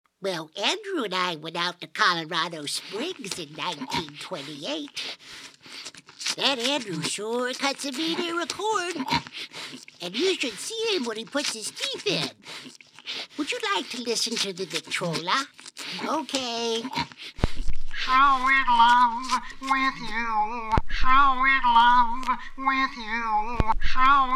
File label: Victrola